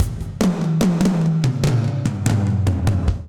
Bricks Fill Dark Plate Verb 73bpm, Session Drums Club; Ableton; Factory Packs